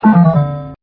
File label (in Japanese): tararan; pachislot; japan